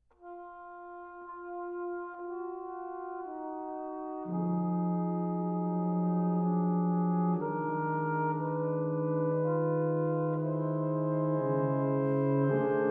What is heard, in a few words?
Sephardic Quintet